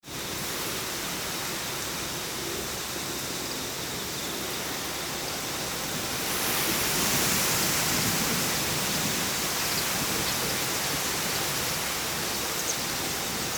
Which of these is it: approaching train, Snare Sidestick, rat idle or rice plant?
rice plant